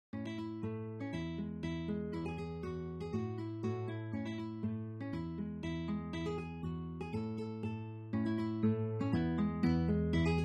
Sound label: polka; Washington Artillery